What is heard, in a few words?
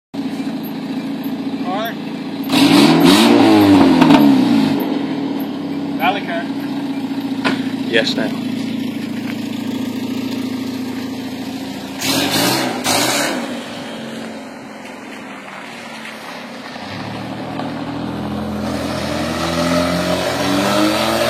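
Straight piped Hyundai Accent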